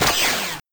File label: bomb